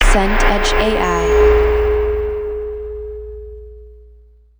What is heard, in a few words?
transition impact reverb